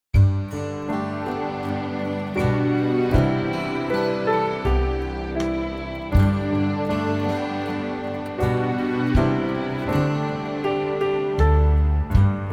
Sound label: The Water Is Wide,recorder; recorder karate